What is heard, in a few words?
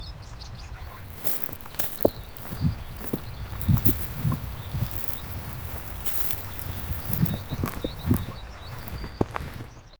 camel walking on desert s; arid